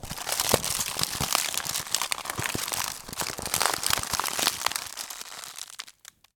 crush chocolate box; sonniss gdc

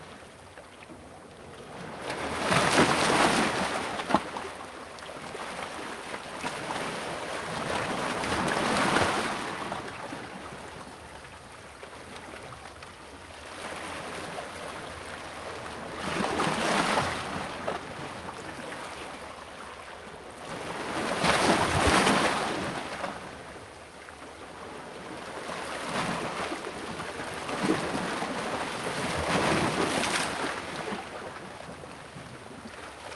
sea mid waves splashing rocks close to drain; Sonniss GDC

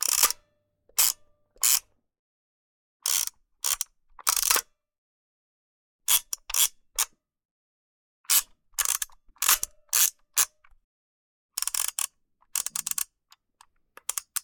camera halina h160 film advance lever mono; sonniss gdc

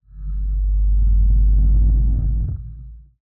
distorted sub drone medium; sonniss gdc

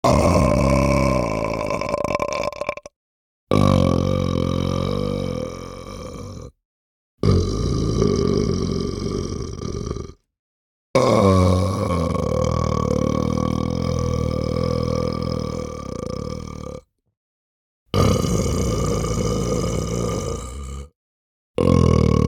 burp long airy; fvtt sonniss gdc audio

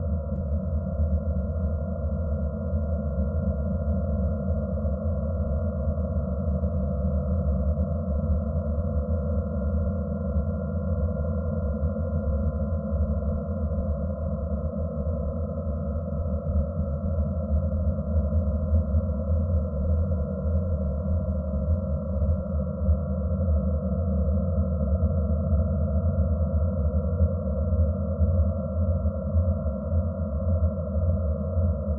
interior tones; sonniss gdc

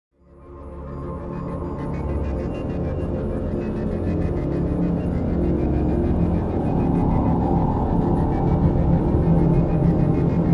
atmospheric dark fluttering darkness; sonniss gdc

